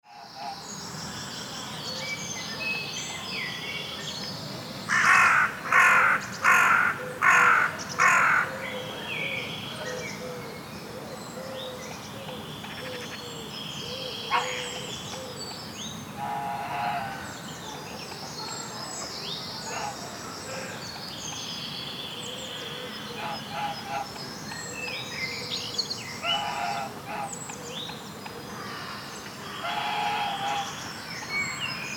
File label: birds crows geese doves